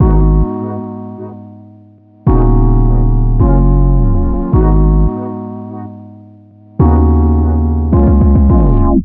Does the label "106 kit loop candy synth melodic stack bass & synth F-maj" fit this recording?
Yes